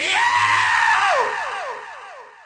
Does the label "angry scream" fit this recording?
Yes